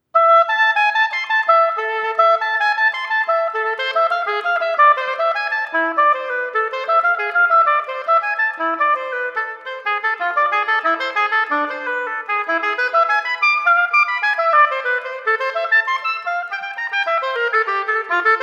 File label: partita; lombardi